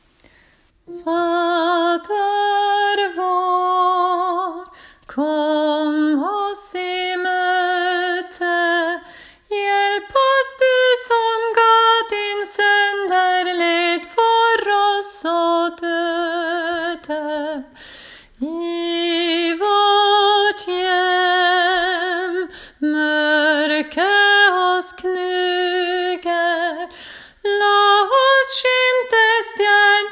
hymne sopran; åfjord

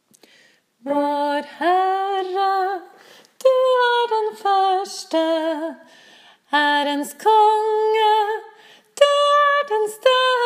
sopran; alfa-og-omega; åfjord

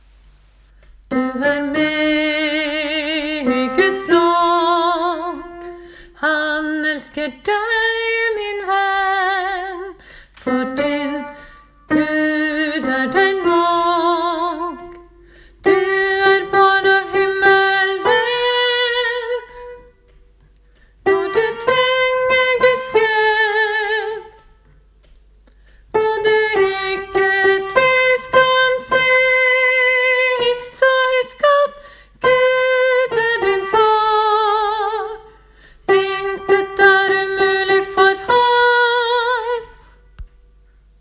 kraft vers2 herrestemmer; åfjord

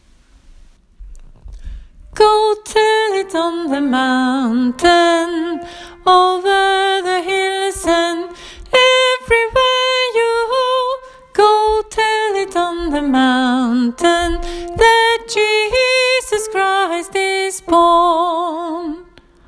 sopran; åfjord

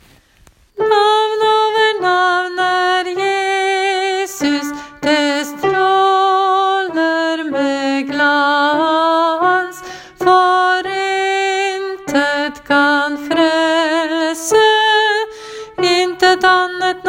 tenor; si-kjenner-du; åfjord

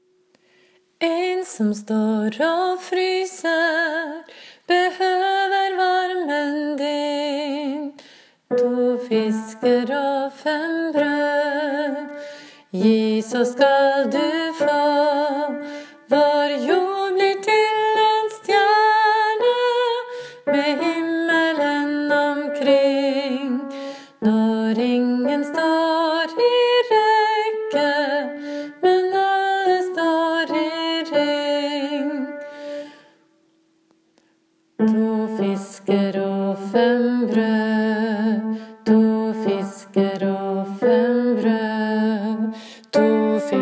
to fisker understemme; åfjord

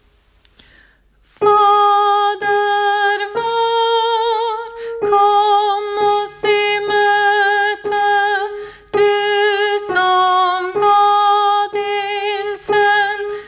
hymne tenor; åfjord

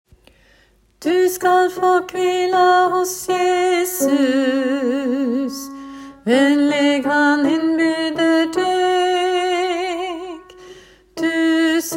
du skal fa kvila hos jesus alt; åfjord

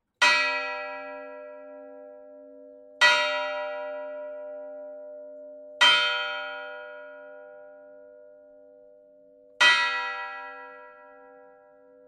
3 x 3 kirkeklokker; gjerdrum

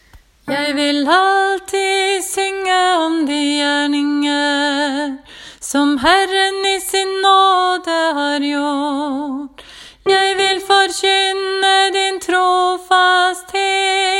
jeg vil alltid synge alt; åfjord

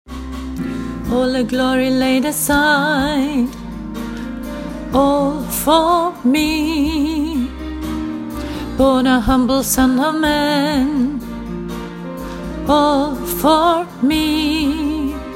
sopran; åfjord

